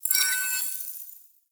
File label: Choir Chorus End